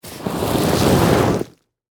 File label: Body Drag Pull, Through, Dirt, And, Rocks; Horror; Blood And Guts; BlastWave